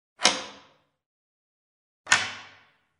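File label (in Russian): Zaschelka, latch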